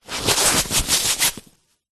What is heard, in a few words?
foley mask fabric sleep eye put on; zapsplat